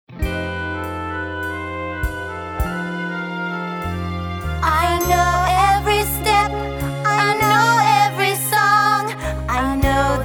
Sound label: transition to detention vocals